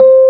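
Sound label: piano C4 p 73, Rhodes suitcase; Keyboards of The 60's and 70's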